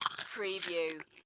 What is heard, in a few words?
Chew Popcorn Short